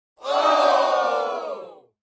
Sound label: Boos; fightingsports